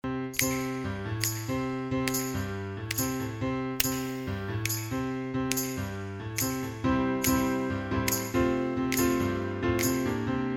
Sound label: Little Girl Crying